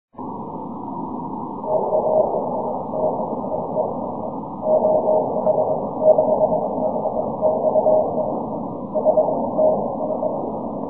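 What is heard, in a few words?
6m continuous wave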